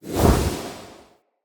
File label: Staff Caster Cast Short, Weapons; Player; Staves; Galene